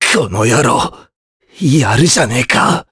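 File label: Vox Dead Japanese; Mitra; kings raid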